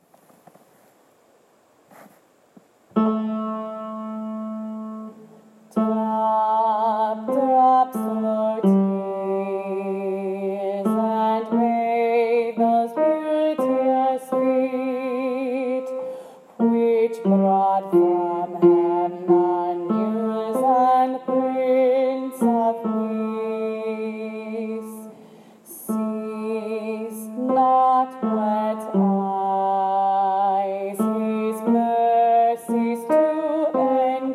tenor, choir; holy week choir practice tracks

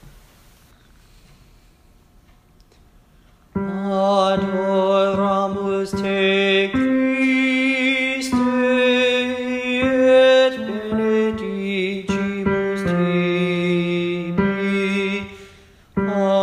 tenor voice, choir; holy week choir practice tracks

